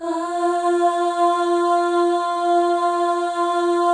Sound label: AHS, FEM; Spectrasonics; FEM.2 AHS